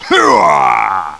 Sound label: bowser growl; GBA; MLSS